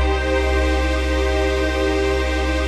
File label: chord pad; Best Service; XXL 800 Pads; Sample CDs